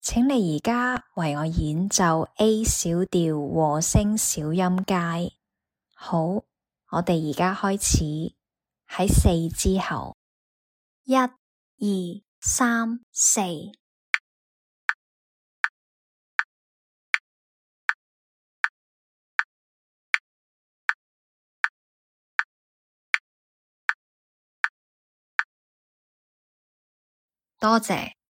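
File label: A harmonic minor contrary motion scale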